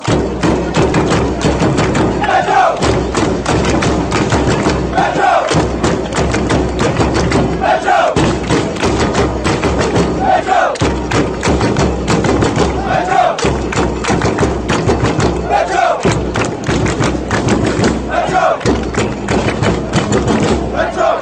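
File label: drums lets go, football fan chants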